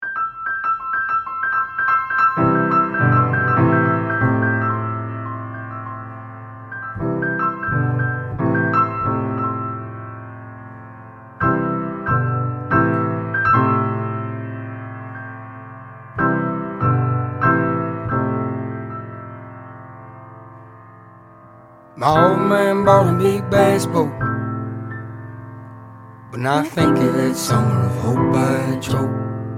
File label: bass